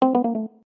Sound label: Low Battery